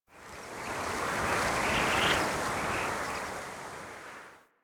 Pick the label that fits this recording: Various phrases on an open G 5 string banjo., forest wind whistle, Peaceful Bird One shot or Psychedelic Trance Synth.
forest wind whistle